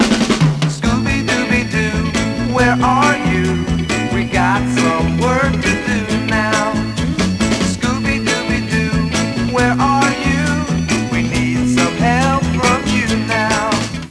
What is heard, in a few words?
tune